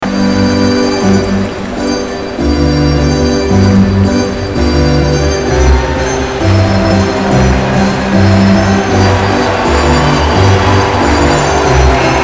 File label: ORGAN tudi tudi tudii tutituu; OLYMPIC